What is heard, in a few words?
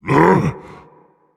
Vocal Colossus Pain Reactions